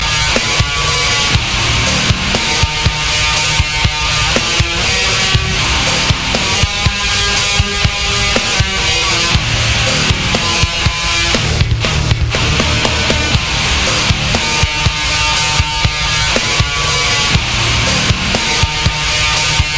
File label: Rock Ringtone